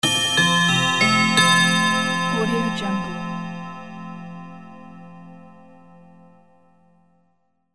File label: clock